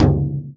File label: BASS, INDIAN PERC